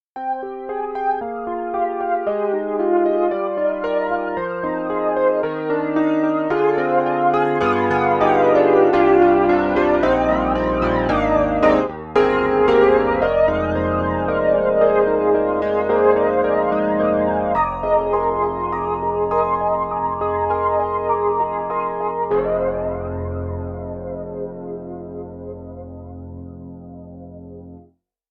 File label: Yamaha CP80 Flanger Strong Flanger; Steinberg; The Grand 3